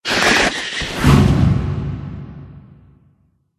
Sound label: bat death